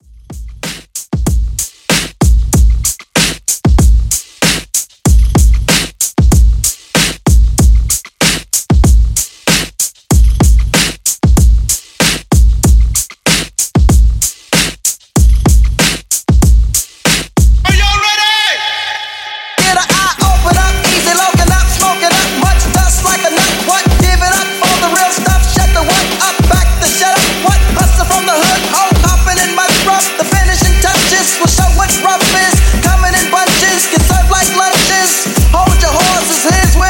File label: Classic Redrum 95 Bpm